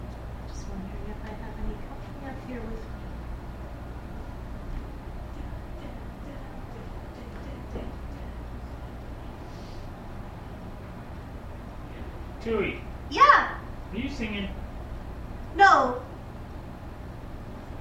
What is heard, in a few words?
singing